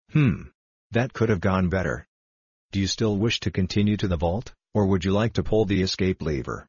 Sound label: "Hmmm"